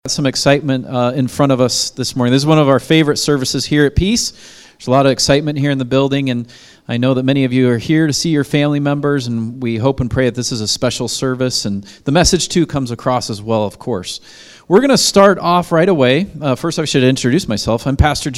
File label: Prelude Music